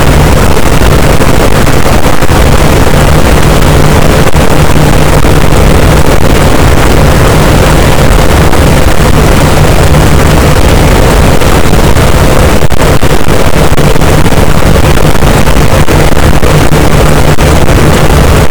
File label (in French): cachalot, boat; Physeter Macrocephalus